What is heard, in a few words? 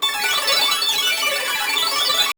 music